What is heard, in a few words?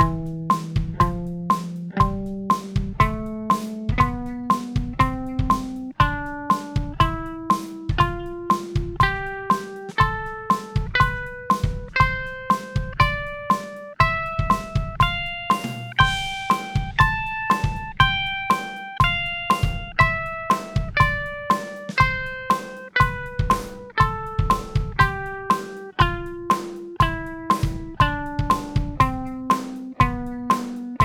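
Am Phrygian bpm120 half; Am #5 Phrygian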